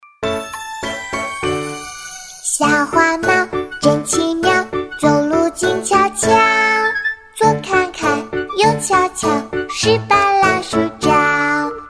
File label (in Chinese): 歌曲翻唱